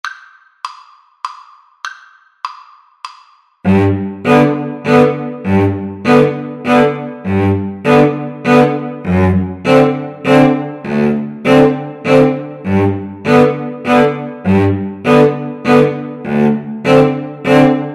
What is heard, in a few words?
verdi la donna, Viola Cello Duet